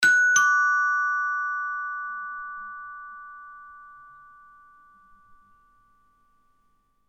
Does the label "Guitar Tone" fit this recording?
No